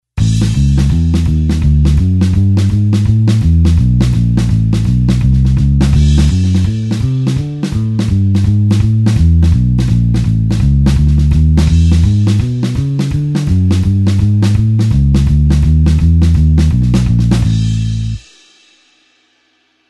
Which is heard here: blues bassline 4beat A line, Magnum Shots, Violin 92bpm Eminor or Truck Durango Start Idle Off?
blues bassline 4beat A line